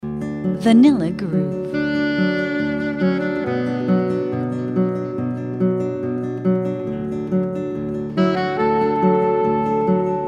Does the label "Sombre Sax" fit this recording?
Yes